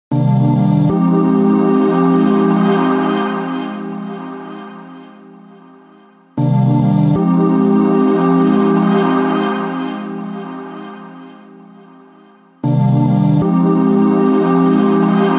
Synthesizer; Nokia Tones; Windows Phone apps

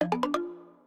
Bird, notifications; Vivo X27 Pro

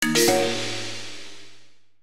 Chinese open; Nokia; audio themes

